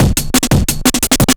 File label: Gated, 175, Breaks; Drum&Bass; EZ Rollers